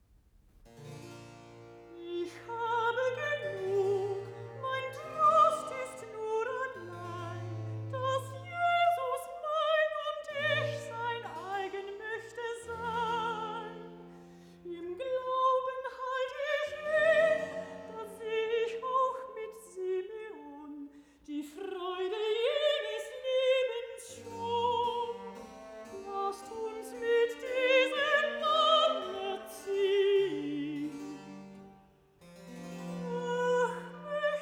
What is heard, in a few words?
Recitativo; Ich habe genug, BWV 82; Bach; Anna Magdalena Bach Notebook (highlights); 尼古拉斯 · 麦克吉根